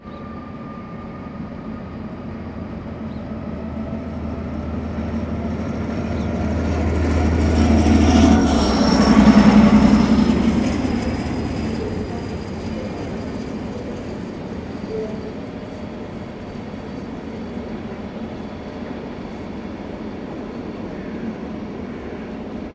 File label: trainstation train driveby; best service Blue Box